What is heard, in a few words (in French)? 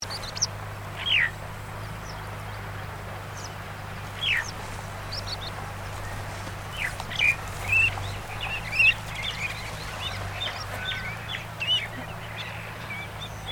pluvier fauve